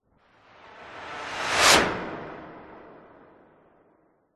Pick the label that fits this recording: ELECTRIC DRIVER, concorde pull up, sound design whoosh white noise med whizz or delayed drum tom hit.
sound design whoosh white noise med whizz